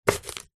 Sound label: apple chop knife wooden chopping board